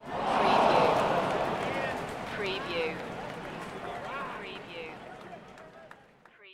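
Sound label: Sports Arena Baseball Crowd Cheer Disappointed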